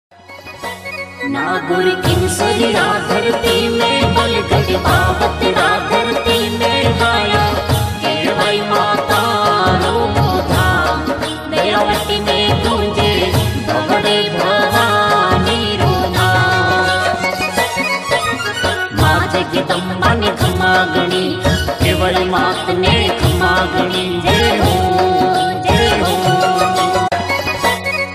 Marwadi Ringtone; ringtones

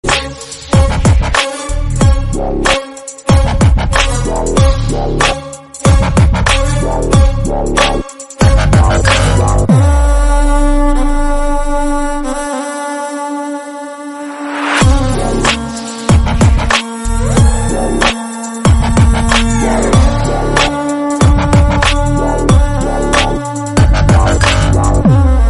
Telugu Bgm Ringtone; ringtones; Jawan

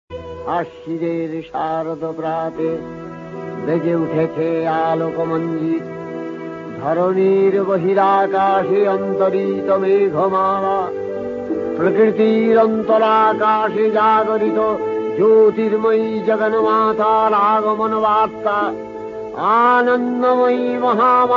Durga Puja Ringtone; Mahalaya

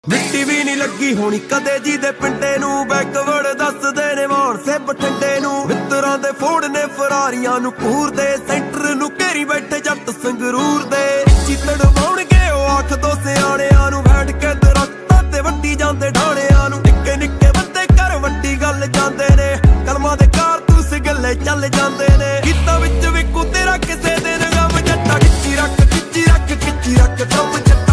Punjabi Song Ringtone

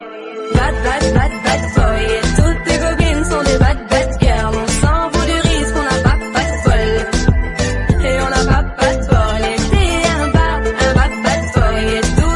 Loud Ringtone

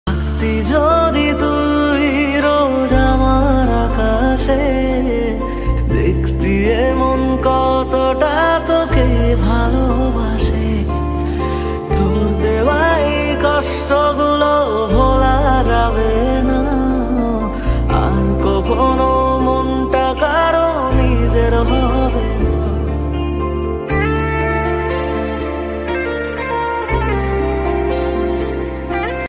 Bengali Ringtone

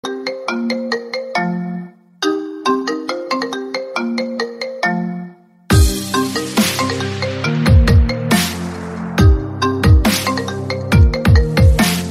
iPhone Metro Gnome Remix One Plus 3 Ringtone

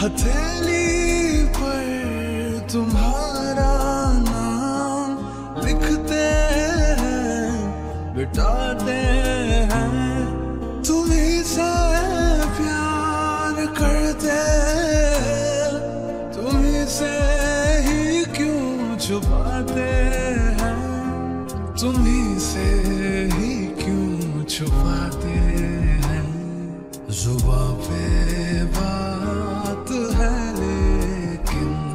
Most Romantic Valentine Day Ringtone

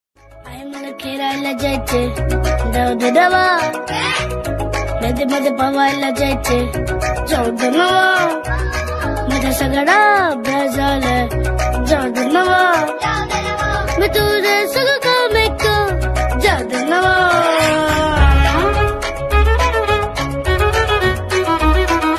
Marathi Ringtone

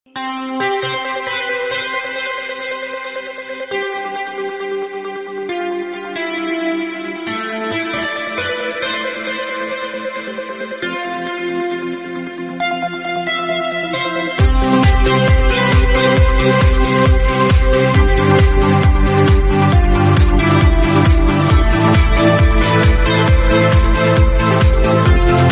Nice Music Ringtone

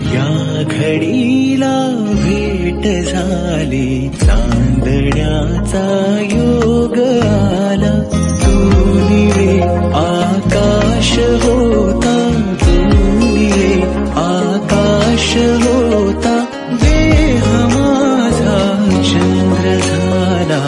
Marathi Love Song Ringtone

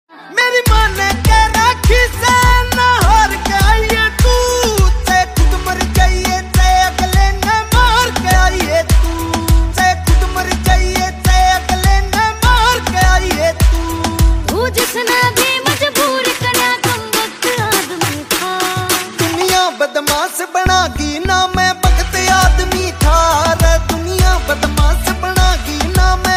New Haryanvi Ringtone

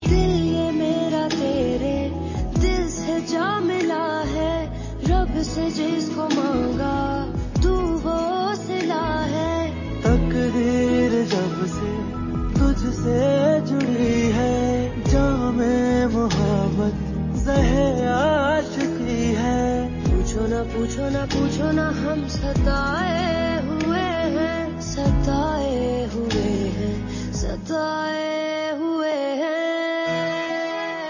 Hindi Song Ringtone

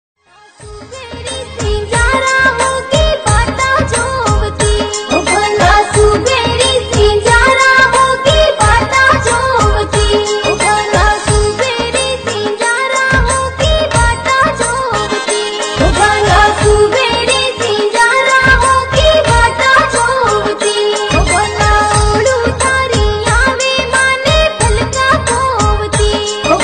Rajasthani Ringtone

